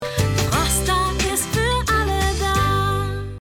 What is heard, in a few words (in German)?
Jingle; FRoSTA